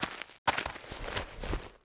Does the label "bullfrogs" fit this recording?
No